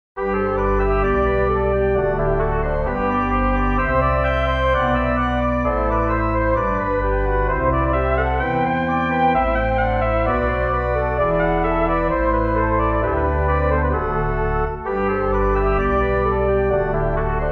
trumpet melody

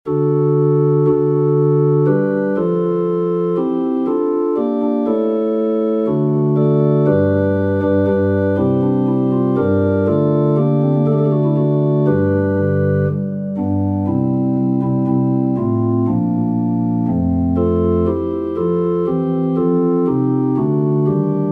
pipe organ

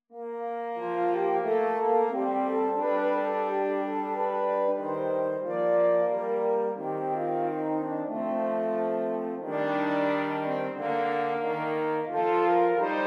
horn trio

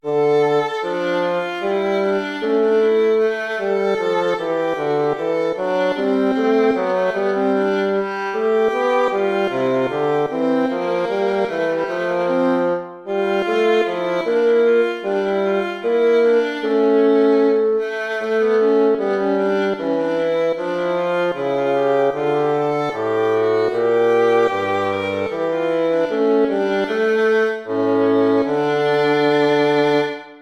air, hornpipe

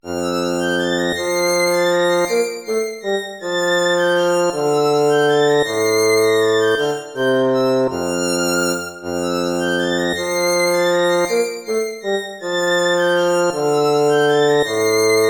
lute

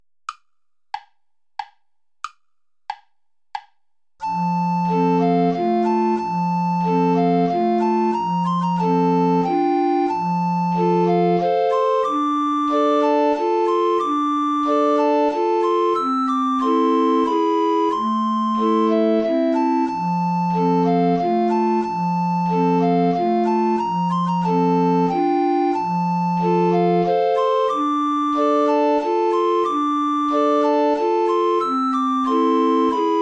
waltz play along without alto recorder

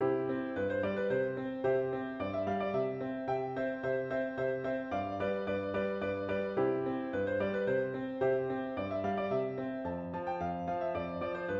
allegro majeur

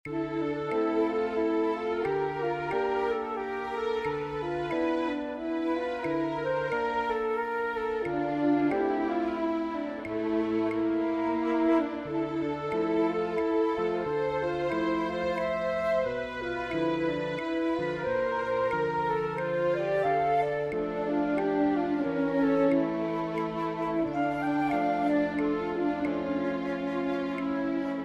easy swing trio

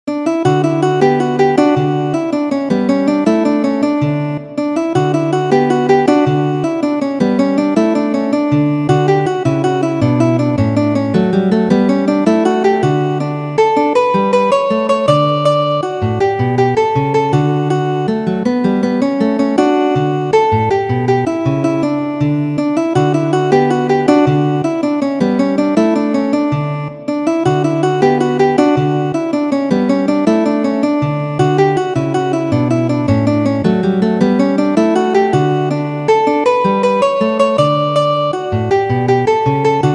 canarios

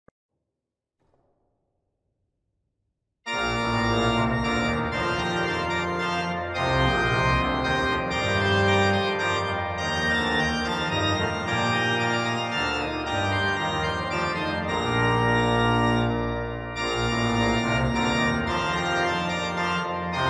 rondeau for concert organ solo; purcell henry; from the fairy queen

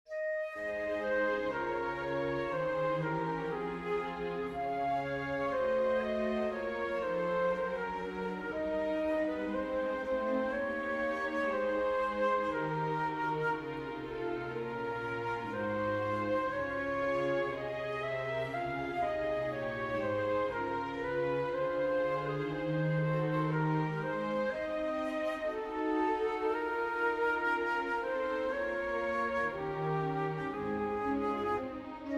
for flute strings; schubert franz peter; das rosenband

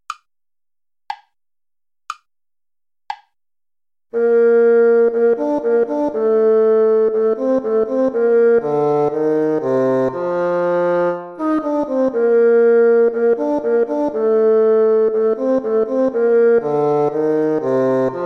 allegretto play along

